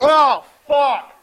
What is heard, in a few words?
mudcrab death; npc mudcrab